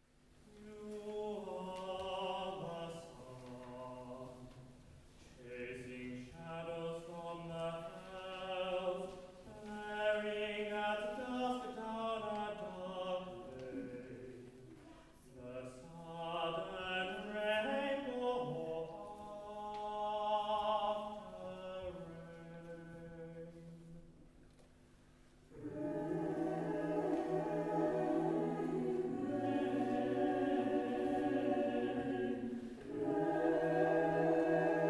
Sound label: baritone; Bowland Sun